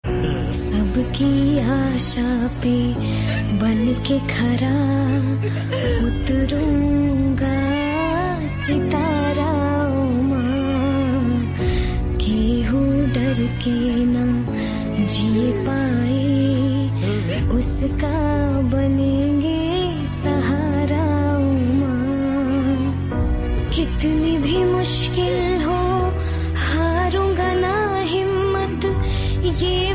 Bhojpuri Ringtone; KGF

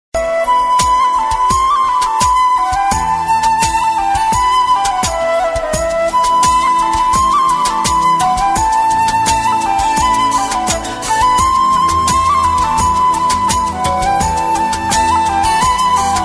Basuri Ringtone

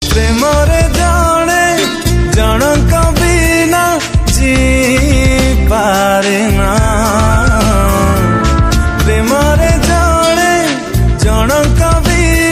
New Odia Song Ringtone

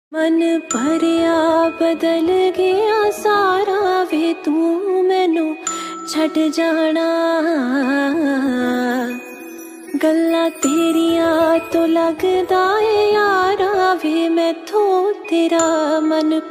Ringtone Female Version

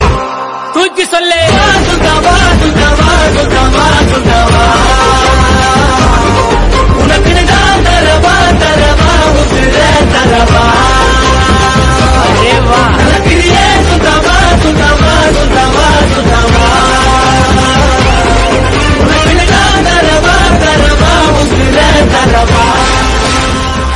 Bgm Ringtone; Jai Sultan

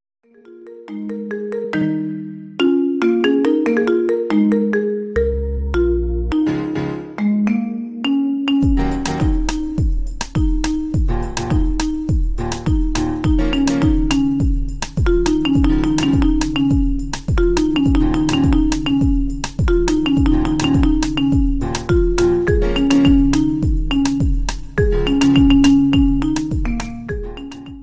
iPhone Xs Max Remix Ringtone; ringtones

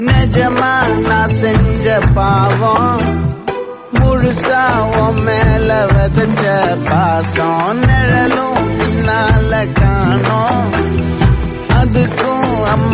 Tamil Song Ringtone

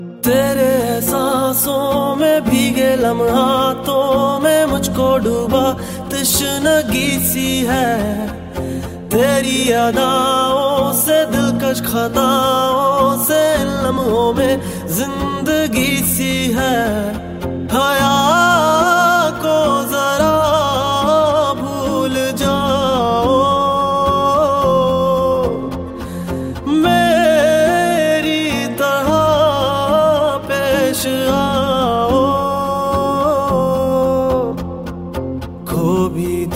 Romantic Hindi Song Ringtone

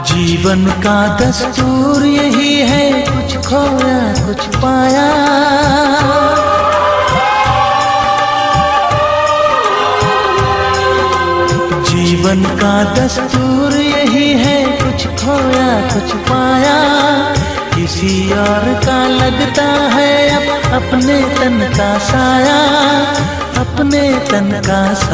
Heart Touching Sad Ringtone

